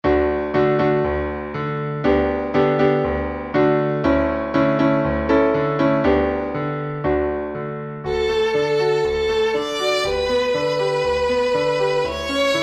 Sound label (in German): Violine Klavier; Besetzung: Violine und Klavier; Tonart: D-Dur; Schwierigkeitsgrad: 2 - Einfach